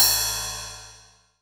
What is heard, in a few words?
ride, funk